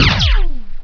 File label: laser; predator